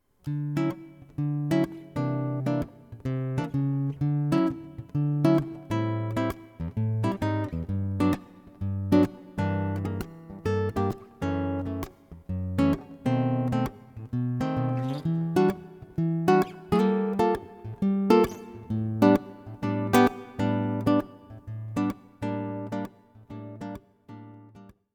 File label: AC-33 Nylon Bossa Nova